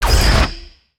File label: Shinobi Slash